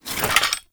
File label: Generic Foley; Warframe; Hoplite